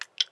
Sex Slide

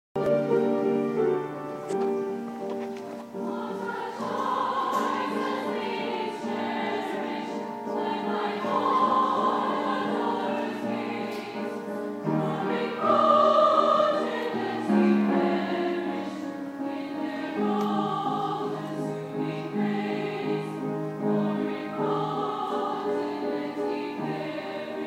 opera, soprano, mezzo; [F]; Gilbert and Sullivan's Silvered Is The Raven Hair from Patience; [operetta]; [singing about getting old]

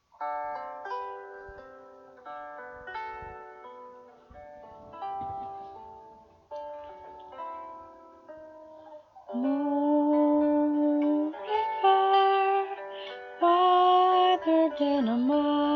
Sing Karaoke Brazilian accent

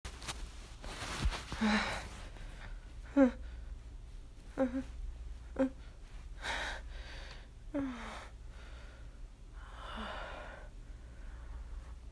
moans and whimpers

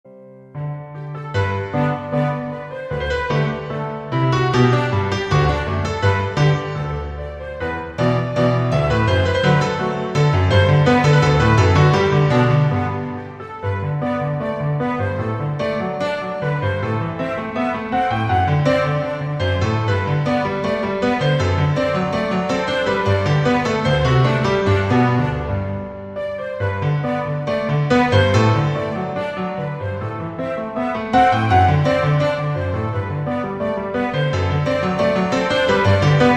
Orchestral Version

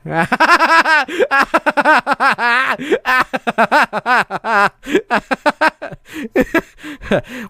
laughinh; Memeish and Reactions; ⁄mlp⁄ Dubs; a sounds adventure through the intranet

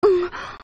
Moan

